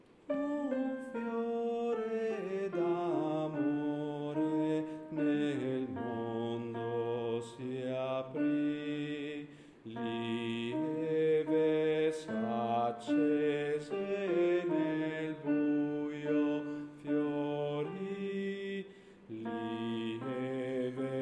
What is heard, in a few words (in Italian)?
BASSI; Avvento e Natale 2016